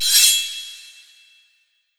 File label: Skill Sword; Berserk